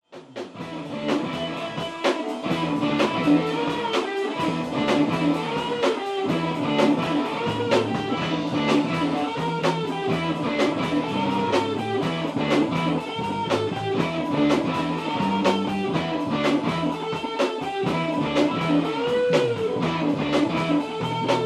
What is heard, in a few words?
bass riff